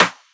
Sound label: Snare; pitchglitch samples; DNBACADEMY LIQUID STARTERKIT